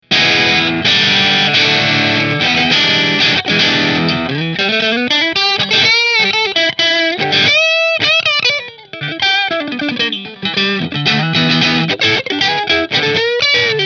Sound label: Rahan Double Maple Position 2 Marshall, guitars; Rahan Guitars RP Double Cut Figured Maple